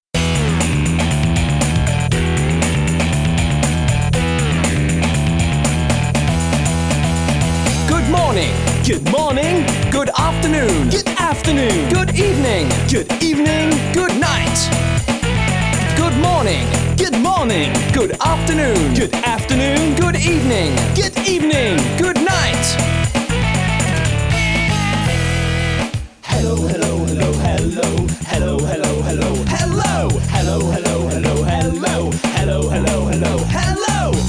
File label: good morning song